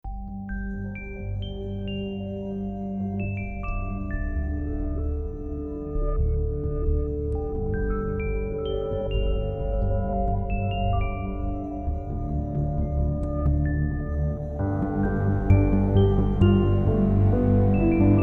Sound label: Ambient